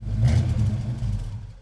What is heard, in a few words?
pontiac off; Mafie 1